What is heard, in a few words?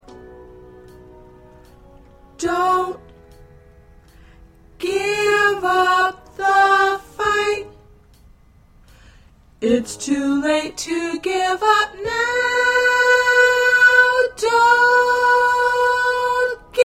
Alto